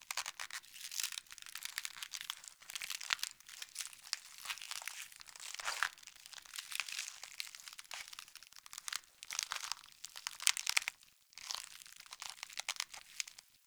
Infested Eris Exterior Critter Loop

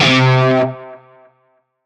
Shawzin Oct Two C; Music; Warframe; Narmer Shawzin

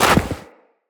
Operator Run Deep Snow, Footsteps; Characters

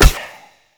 Choir Bow Quick Fire; Warframe

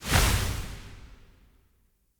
Trick Ghost Despawn Burst; Enemy; Abilities; Characters; Circade

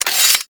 Spear Gun Equip; Warframe; Tenno; Hoplite

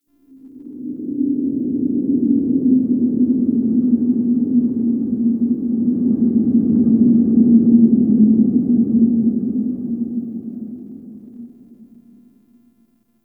Interior Wind Howl; Ambience; Warframe; Duviri

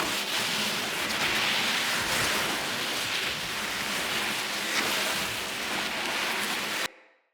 Ladder Slide Loop

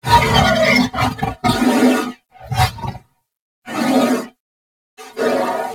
Horse Trot Breath; Vehicles; Vocals; Warframe; Duviri

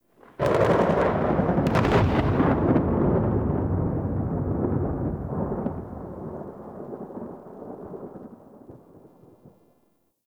Exterior Rolling Thunder; Duviri

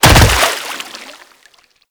Infested Ancient Land Water

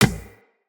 Beach Ball Kick; Water Fight

